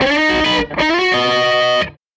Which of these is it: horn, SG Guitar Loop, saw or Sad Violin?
SG Guitar Loop